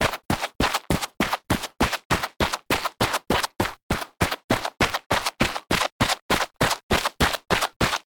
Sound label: dash soil